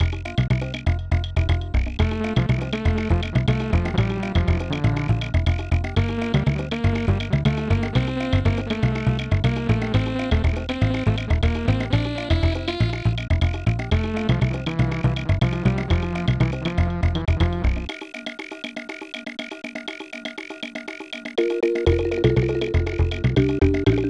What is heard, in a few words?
Steel Drum Rhumba; DK Country 2